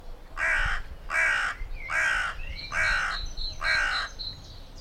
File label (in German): Rabenkraehe Corvus corone